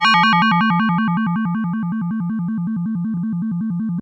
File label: FM Bell FX DX100 G5